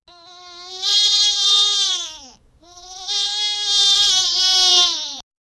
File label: mammals